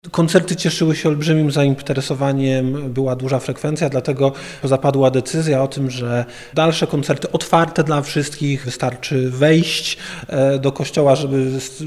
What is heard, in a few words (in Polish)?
organy; Koncerty na odbudowanych organach w bazylice św. Elżbiety we Wrocławiu; radiorodzina